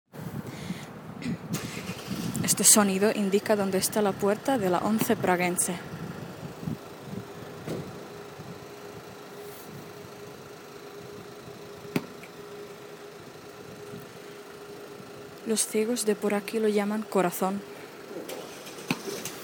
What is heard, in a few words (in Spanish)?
indicador de puerta; de la once praguense